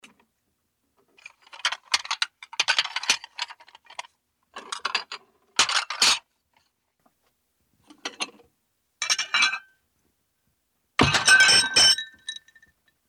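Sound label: saucers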